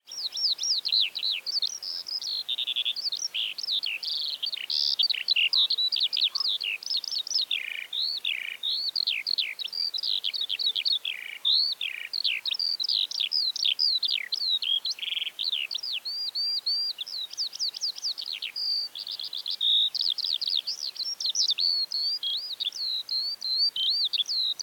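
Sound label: stepnoy zhavoronok voice, calandra lark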